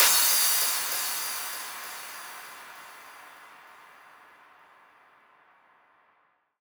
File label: Massive Crash; ABLETON; PsyTrance Project